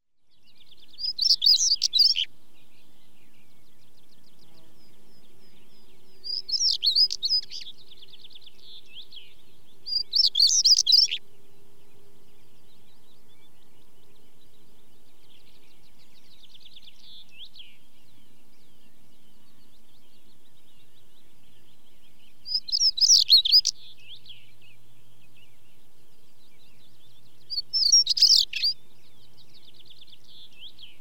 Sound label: Stonechat song with chaffinch and skylarks in background, British Birds; The BBC Sound Effects Library